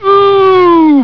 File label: ooooo